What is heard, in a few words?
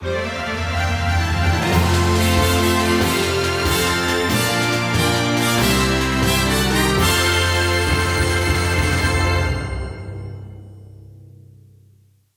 Fanfare